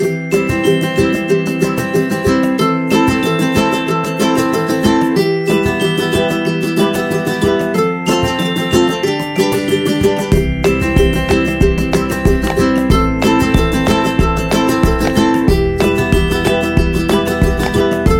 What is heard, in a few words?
Joyful, happy music; happy music vol 2